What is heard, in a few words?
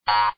action Error; jclic